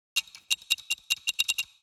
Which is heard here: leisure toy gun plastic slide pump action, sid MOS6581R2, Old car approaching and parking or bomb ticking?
bomb ticking